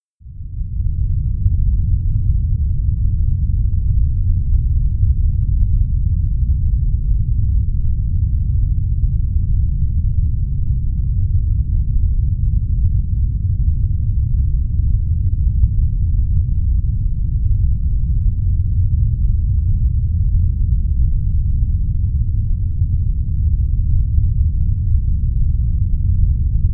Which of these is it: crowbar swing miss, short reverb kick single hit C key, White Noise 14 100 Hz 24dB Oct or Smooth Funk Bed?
White Noise 14 100 Hz 24dB Oct